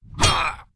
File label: daemon attack; propack